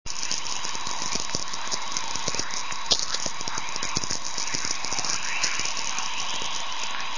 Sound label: whispers; ELF-VLF; Operations; Dutch Signals